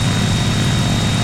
turboprop; mcheli

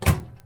bomb; mcheli

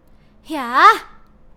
Attack, human female heroic; customnpcs

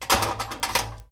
wrench; mcheli

